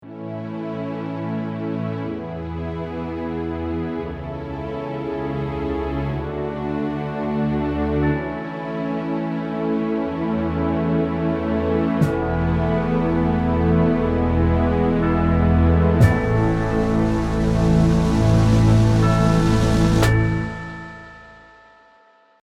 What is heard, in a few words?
Hollywood Crescendo Stinger, background music